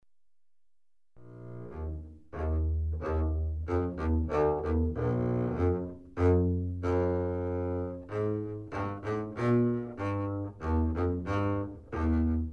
contrebasse

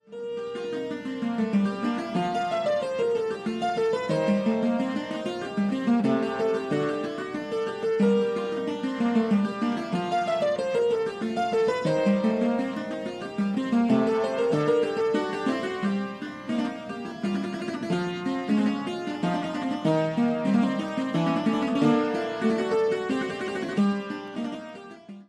clavicorde

